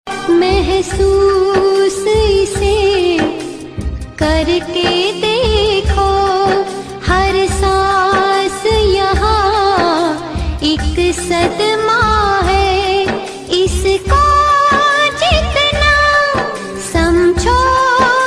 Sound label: sad tune ringtone